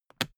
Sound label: briefcase plastic latch lock close; industrie wirtschaft; bueroarbeit